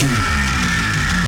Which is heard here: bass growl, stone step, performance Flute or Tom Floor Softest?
bass growl